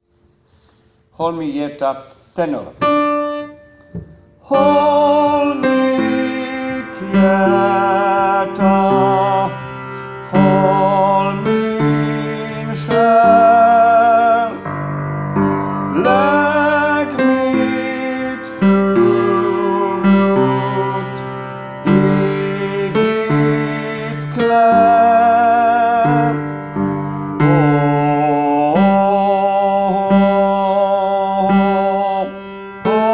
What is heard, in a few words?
tenor